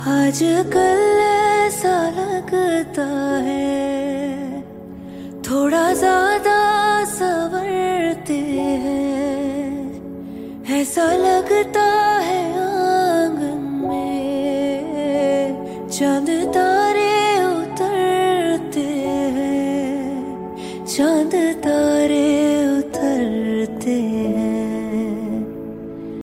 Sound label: lyrical romantic song tone ringtone